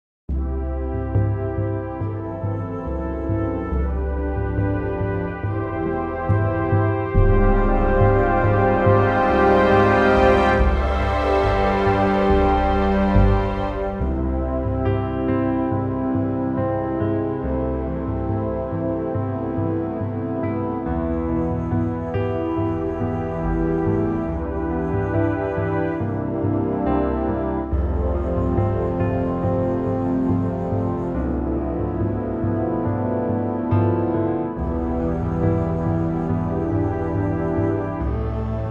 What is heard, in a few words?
C orchestra